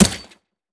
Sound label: frag physics bounce mud hard; gmod; viper